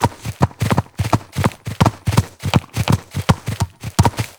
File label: Ram Walk Loop; Animals; Duviri